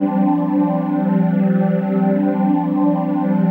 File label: PHASE PAD